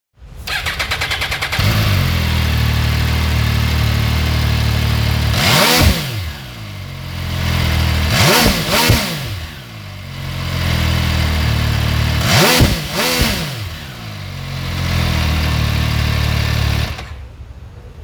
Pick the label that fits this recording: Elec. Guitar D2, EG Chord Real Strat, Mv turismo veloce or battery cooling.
Mv turismo veloce